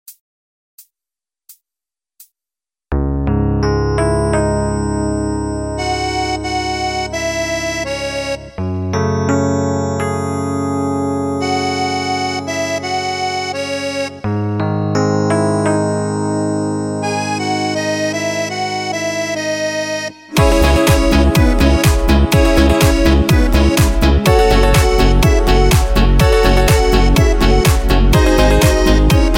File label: song voice, Korg Pa800s